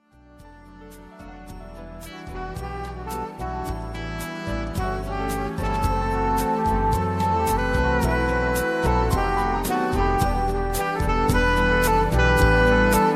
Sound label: sax; latin